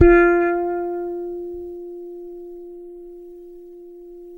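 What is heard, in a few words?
fretless bass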